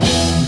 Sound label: classic rock